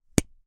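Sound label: quill pen and inkwell sounds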